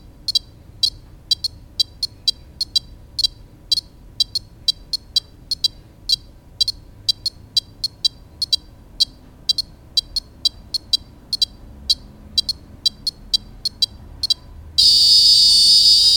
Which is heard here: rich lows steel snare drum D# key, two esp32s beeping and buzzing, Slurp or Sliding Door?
two esp32s beeping and buzzing